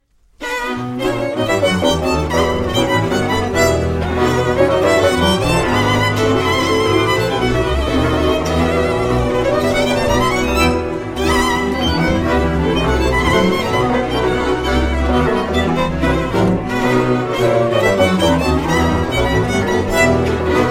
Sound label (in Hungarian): verbunk; vasvari